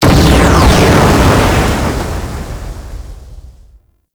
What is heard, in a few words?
Hammer Boss Death Explosion; Warframe; Grineer